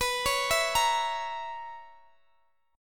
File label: B Major 7th Suspended 2nd Suspended 4th B down Guitar DADGAD